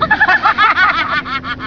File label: laughter; apes